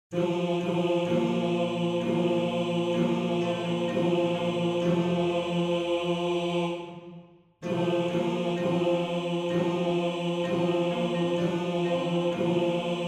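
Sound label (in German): Tenor 2, Männerchor; Alles Leben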